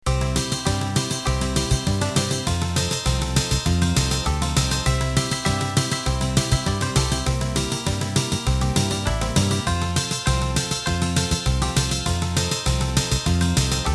Cajun Country, Cajun-Zydeco-Country style full of gumbo with Fingered Electric Bass, Banjo, Acoustic Guitar and Jazz Electric Guitar